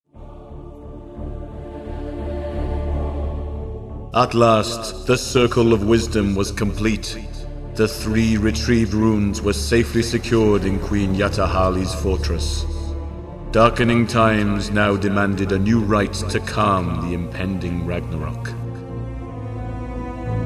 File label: Mountain Clan Win